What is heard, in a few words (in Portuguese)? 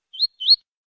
coleiro tui tui